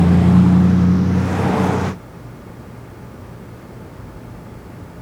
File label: air outside; Takeshi and Hiroshi